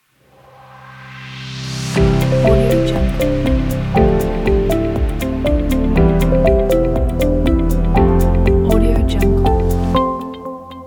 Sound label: corporate logo